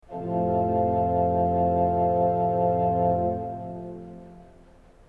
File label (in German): ton, orgel